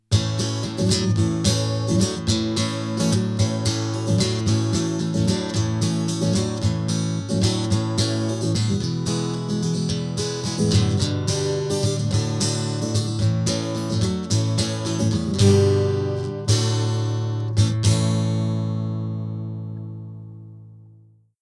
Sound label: acoustic